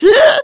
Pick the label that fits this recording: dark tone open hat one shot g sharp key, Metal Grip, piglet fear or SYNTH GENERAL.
piglet fear